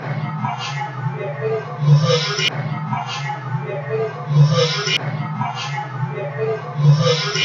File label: EVP Loop